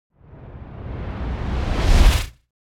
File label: Whoosh Fat Storm - reversed; Hollywood Edge; sound designer's toolkit v2